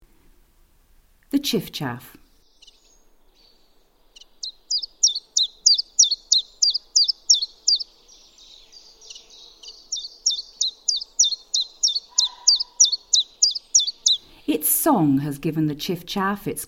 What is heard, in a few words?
Chiffchaff audio